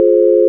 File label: sine; 音の波形と分析